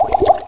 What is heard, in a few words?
potion drink; baseq2